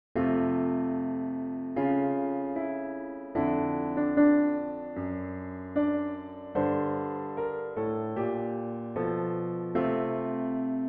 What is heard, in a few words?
3 Part Accompaniment Only; Panis Angelicus (F); O Lord Most Holy